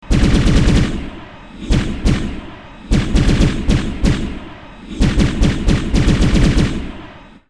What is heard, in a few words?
rifle